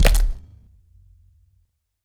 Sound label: bone crack